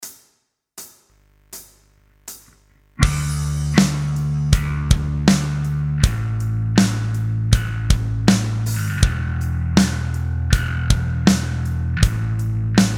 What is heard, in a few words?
Backing Track; beginner guitar mastery